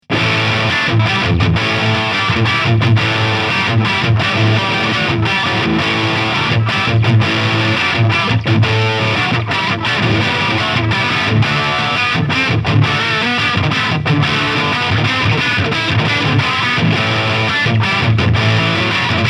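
Dean Exile 6 String Multiscale Kahler Lead, guitar; Dean Exile Select; Burled Maple SNBB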